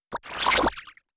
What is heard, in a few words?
bubbling